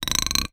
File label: Bolt Base Rub, Medium Wine Glass